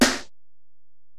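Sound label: Acoustic Snare, Alesis DM-PRO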